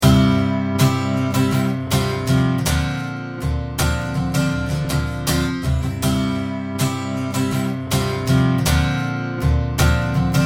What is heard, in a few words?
pan left right